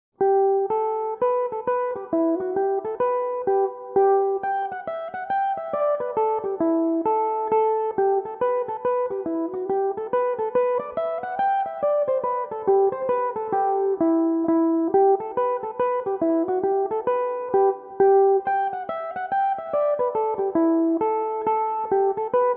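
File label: E minor, irish mandolin, tunes; gan ainm 22; belmuse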